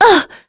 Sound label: Damage; Veronica; kingsraid